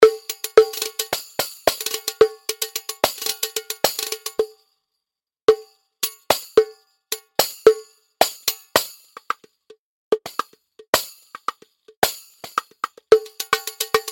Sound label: ethno percussion loops